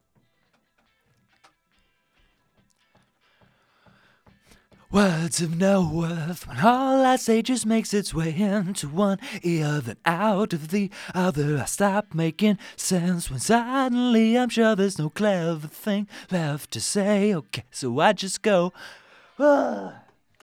vox; logic

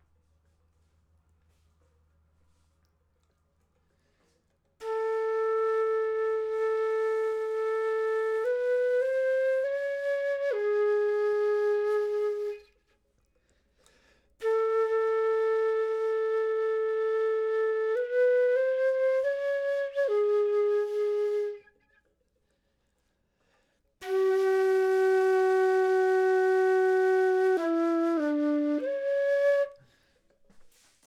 flute; logic

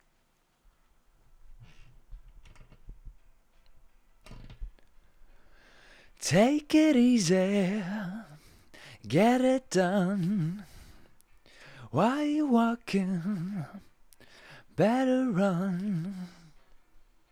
vox; logic

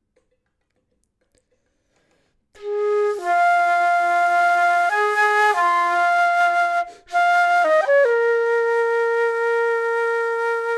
flute; logic

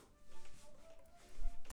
flute

